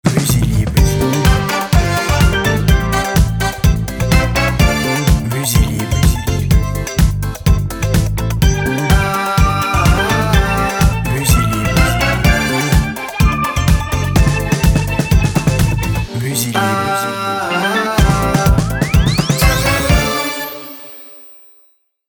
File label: Time to disco jingle